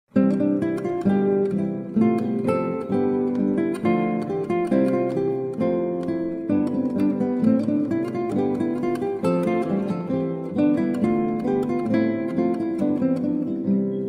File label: Bransle Double; Classical